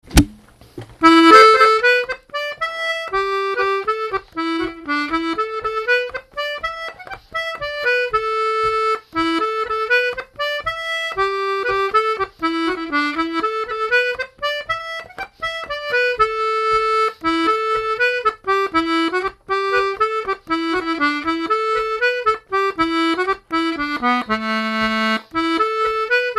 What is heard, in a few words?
POLKA; BALLYDESMOND